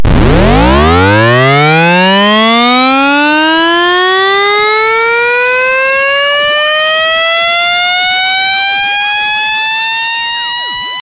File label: SUPER SIREN